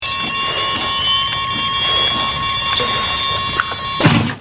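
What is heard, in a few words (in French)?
Défibrillateur hopital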